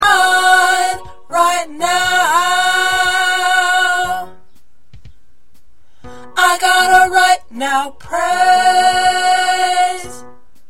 Tenor

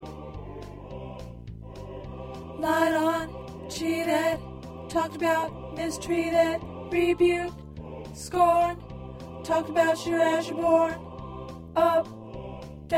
Choir Tenor; Long As I Got King Jesus

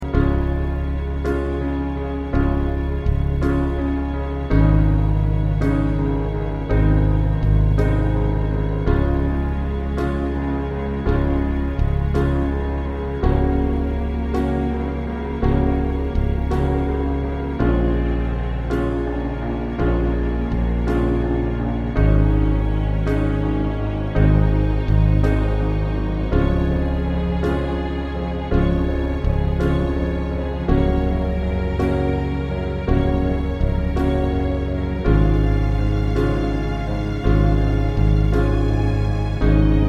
Accompaniment

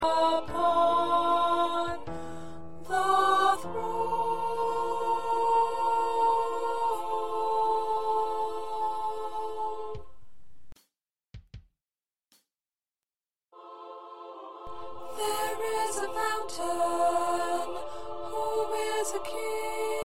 2nd Alto

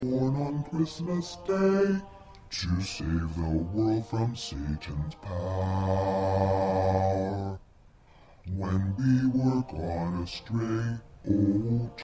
Bass

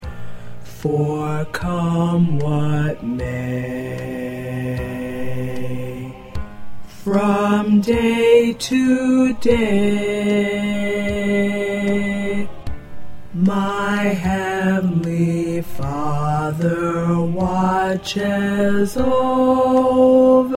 Baritone, choir; spirituals and congregational songs

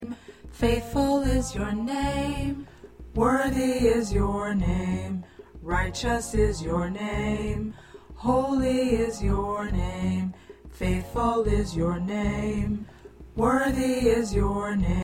P Tenor

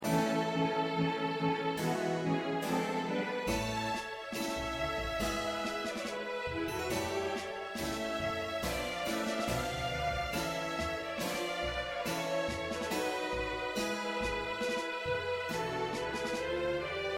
Accompaniment

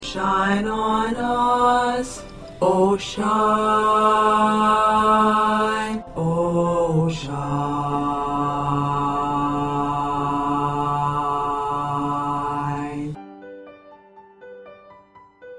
1st Bass

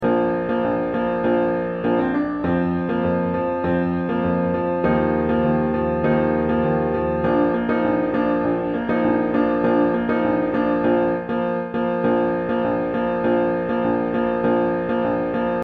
Piano

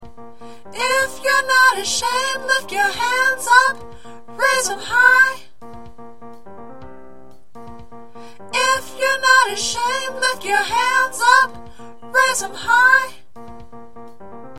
Soprano, choir

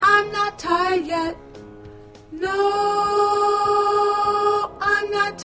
Alto

